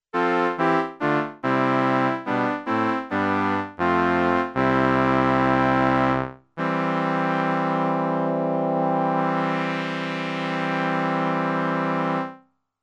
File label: BRASS w tone adjustments